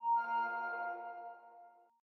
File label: Ping Rune Quiet